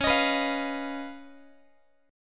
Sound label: Db 9th Db down Guitar; JGuitar